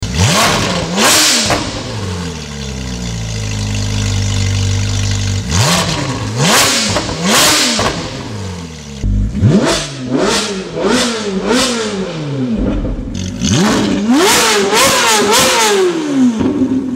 Ferrari 458 Speciale

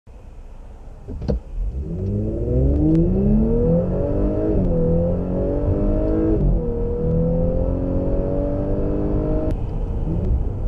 Audi RS 5 Coupe

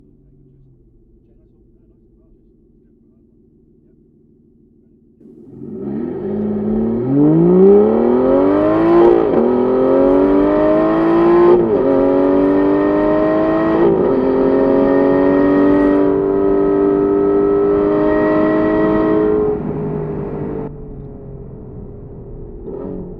Aston Martin Vanquish

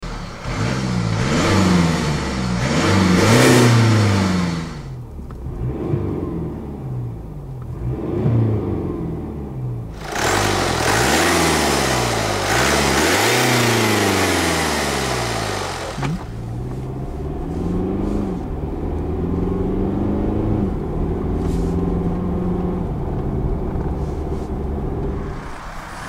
Mercedes-Benz E-Class Wagon

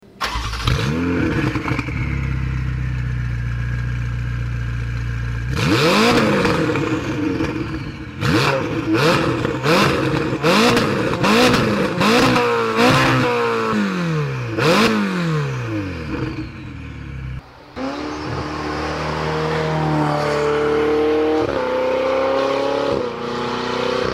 Ferrari 488 GTB

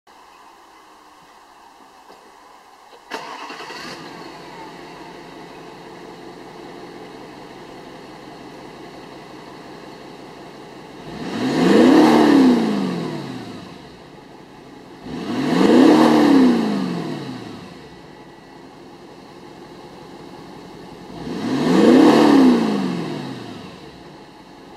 Audi A8; cars

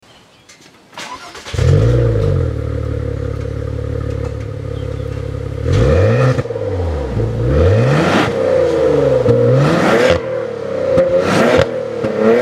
Maserati Quattroporte S; cars

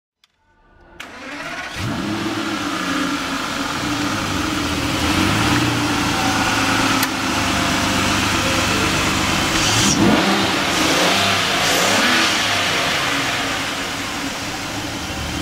Aston Martin DB9 Volante

